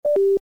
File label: alert